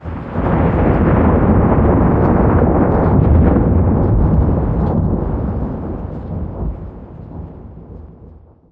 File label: teleport postblast thunder; Garry's Mod; hl2; labs